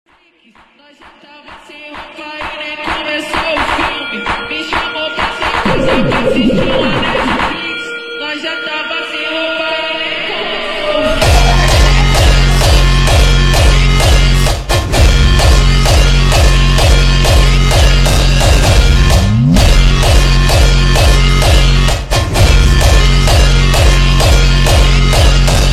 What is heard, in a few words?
montagem jumpstyle brazilian funk